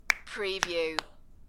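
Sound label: Three Knuckle Crack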